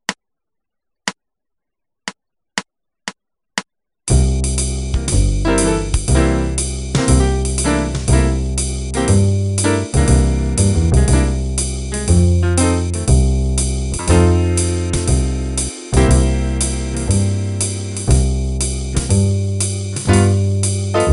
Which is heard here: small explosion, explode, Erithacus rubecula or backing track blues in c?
backing track blues in c